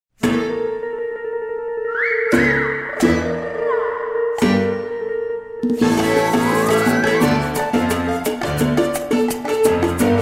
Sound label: Maracas